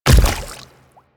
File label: Splitter Step Water, Footsteps